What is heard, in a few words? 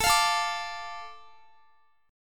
A Suspended 4th Sharp 5th, Guitar; Asus4#5; strummed; x,x,21,22,21,22